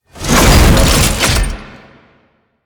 Lasrian Tank Swing Attack; Enemies; Abilities; Warframe

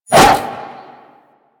Arch Wing Sword Short Whoosh; Warframe; Weapons

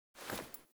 Foley Walk, Clothing; Characters; Player

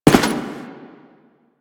Step Default; Warframe; Quests; The New War; Veso

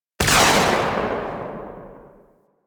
Railgun Tail; Weapons; Warframe; Archwing

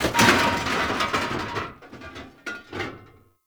desktop metal destroy; Warframe; Ambience; Entrati

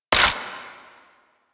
AK47 Low Clip; Warframe; Tenno; Weapons

